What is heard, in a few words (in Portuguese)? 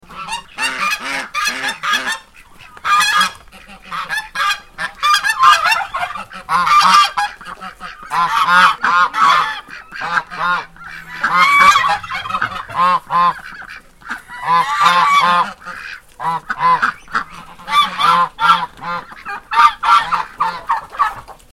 Ganso